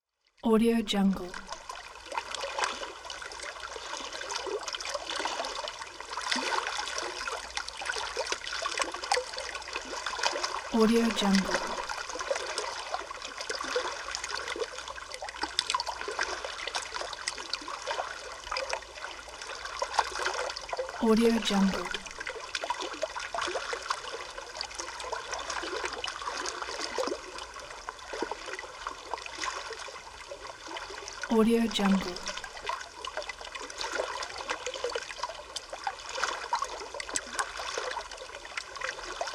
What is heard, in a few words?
lake waves